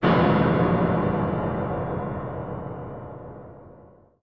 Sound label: shock